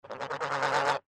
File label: turkey squawk